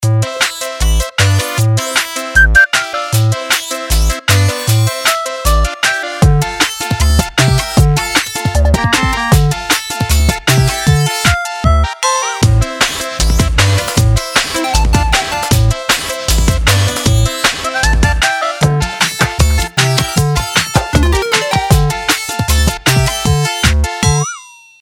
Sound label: loop